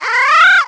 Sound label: Bokoblin Retrieve